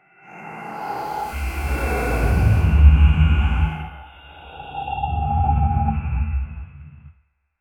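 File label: dark biomechanical creature; cyberpunk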